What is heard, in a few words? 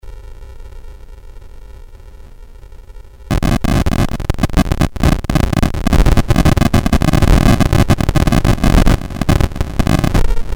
sound effect generator